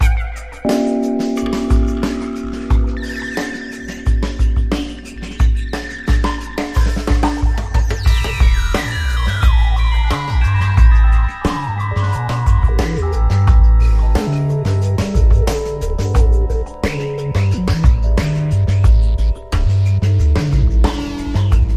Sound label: sonic scale for percussion; 90'S JAPANESE